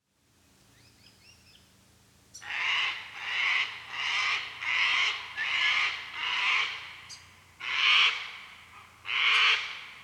Sulphur crested Cockatoo Cacatua galerita galerita